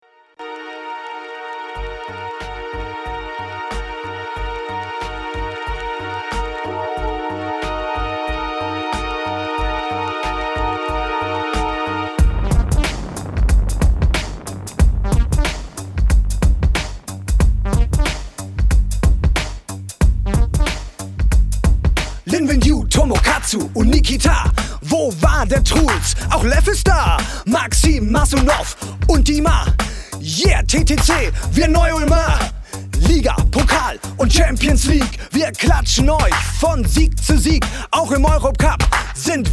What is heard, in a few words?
Rap